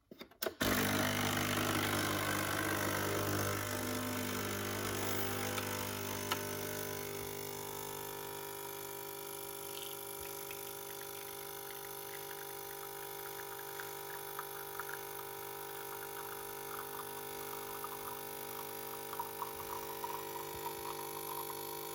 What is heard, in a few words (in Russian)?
prigotovlenie kofe v kofmash